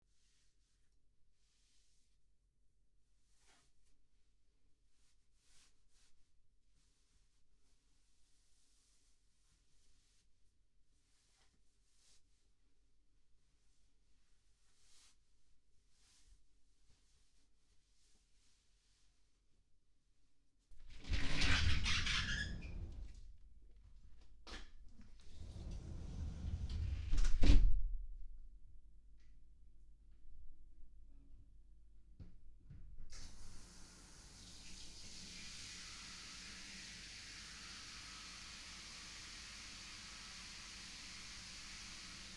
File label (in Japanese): シャワー休憩, ASMR